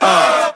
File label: "ah", group chant; Voice; ooh ahh